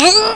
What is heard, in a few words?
gurp; quake2; hottie